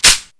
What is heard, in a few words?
bolt release